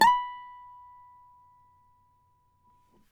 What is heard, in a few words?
CAVAQUINHO SLIDE, Guitars